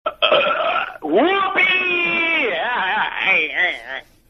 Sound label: Belcher